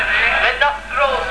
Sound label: nostrils